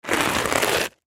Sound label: Rock Salt Footstep, Rock Salt Foot Step Grind; Foley; Materials; Blastwave